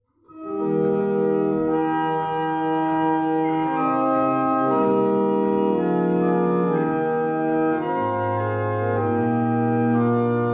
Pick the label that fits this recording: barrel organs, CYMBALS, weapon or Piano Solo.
barrel organs